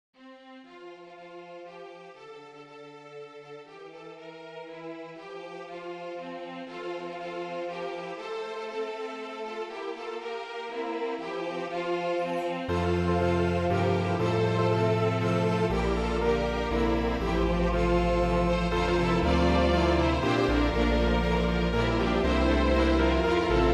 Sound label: Piano